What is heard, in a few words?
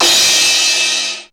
RX15 CRASH; Crypton - DrumBase5000; RX15+17+21L